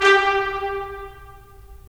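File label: brass trumpets staccato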